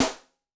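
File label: Soprano, Yamaha DTXpress-II snare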